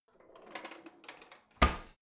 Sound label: old drawer creaky slide in